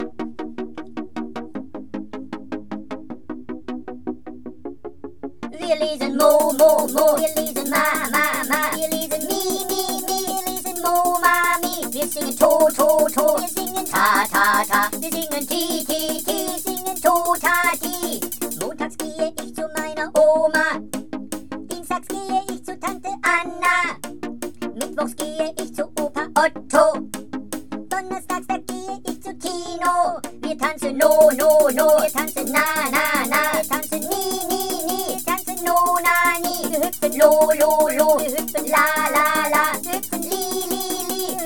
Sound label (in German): HipHop Spuren sehr schnell; 3 Spuren